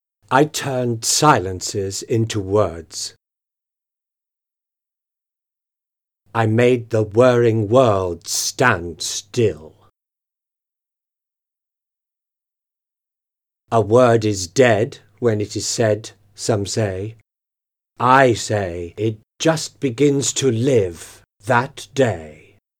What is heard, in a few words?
Band; Gandalf style